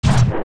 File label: Fall Down; Link